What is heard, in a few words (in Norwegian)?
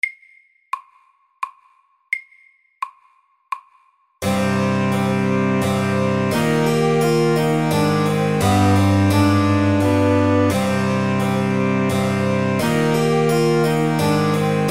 Menuett MIDDELS